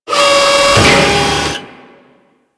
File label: Shipyards Robot Arm Long Move; Ambience; Props; Warframe; Grineer Shipyards